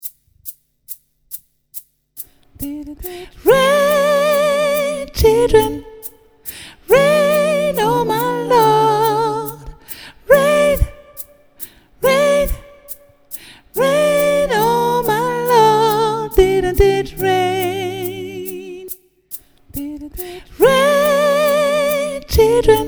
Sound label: Soprano, Gospel; Didnt it rain